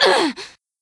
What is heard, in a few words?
Grunt; Lewd SFX